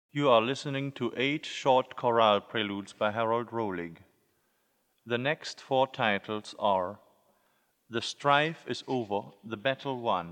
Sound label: Short Chorale Preludes; 8 Short Chorale Preludes; 5 The Stife is Over